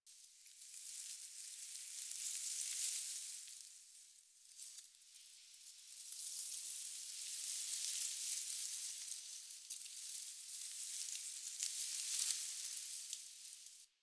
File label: Fall Tree Leaves Loop; Ambience; Warframe; NineteenNinetyNine